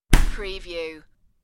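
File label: Fridge Door Close; cooler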